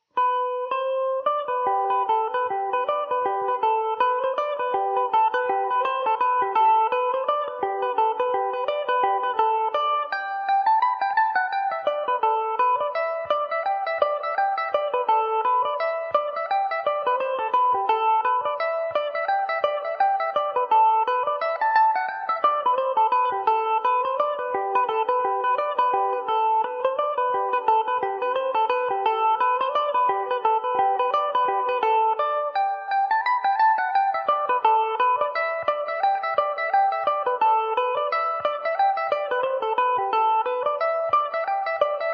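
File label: G major revelation, irish mandolin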